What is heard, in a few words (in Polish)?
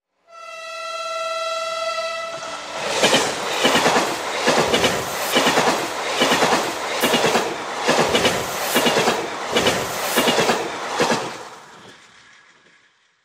pociag